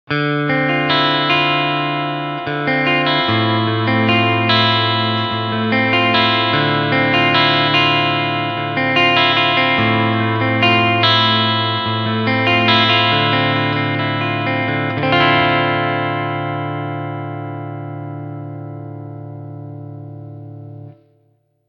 Beide PUs Clean

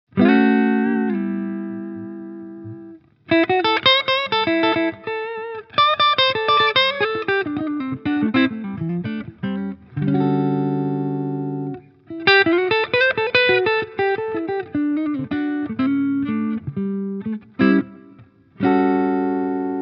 Yamaha Revstar RSE20 Hals clean Switch

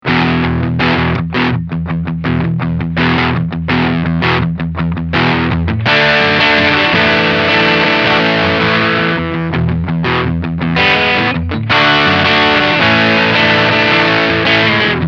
Overdrive Pur

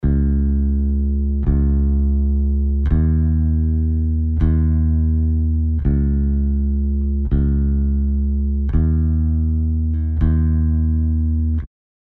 Melodyne 5 Bass unbearbeitet

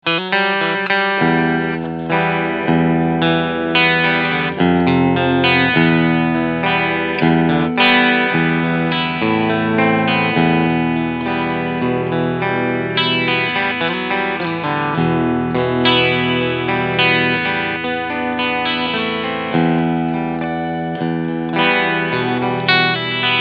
Squier Tele Clean Sound Steg PU; 40th Anniv

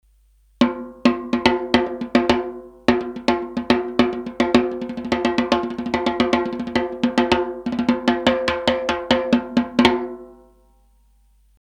Tom Snare o. Teppich; Kirchhoff Test

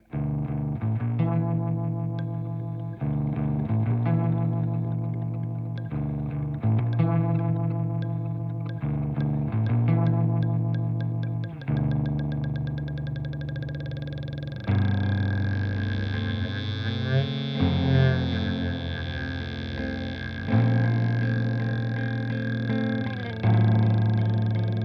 Lethargy Gitarre; Dreadbox

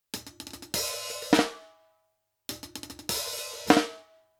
Mackie EM89D Abstand 20cm Snare HiHat Kabelverbindung

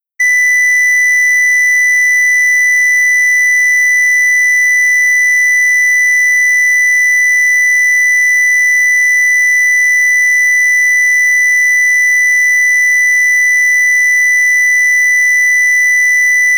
iVCS3 Sinus 2000Hz

